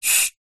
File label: industrial gas release hiss short from gas bottle